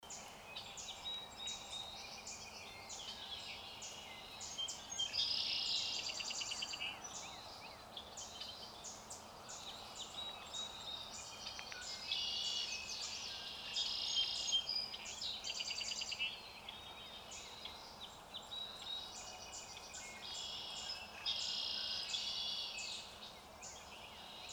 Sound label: Beautiful Marsh Plus One Unusual Bird